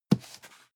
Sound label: cardboard box medium grab slide soft; objekte